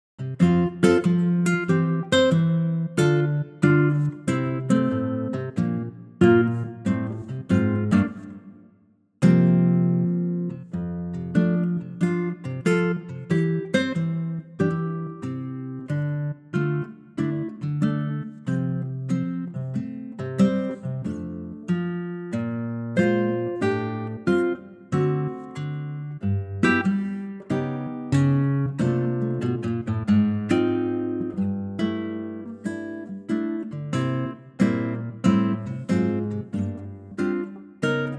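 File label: F guitar, male; key - F - vocal range - F# to A; Wonderful lightly swinging acoustic guitar arrangement; this great old standard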